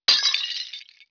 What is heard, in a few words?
drop glass; Heretic II Demo